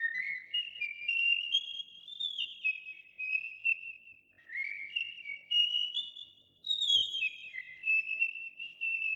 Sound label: Firetop